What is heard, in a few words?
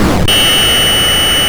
MOS6581R2, sid; Future Knight